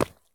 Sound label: sprint asphalt; Escape from Tarkov